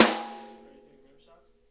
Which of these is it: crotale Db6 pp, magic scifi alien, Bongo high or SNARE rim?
SNARE rim